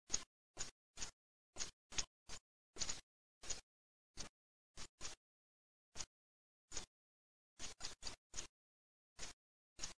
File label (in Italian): zip